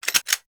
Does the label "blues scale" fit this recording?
No